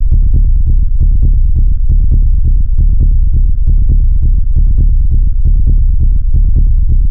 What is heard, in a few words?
Bass Loop C 135, Techno; Ghosthack; AC2024 - Day 1 - Techno Sounds 2025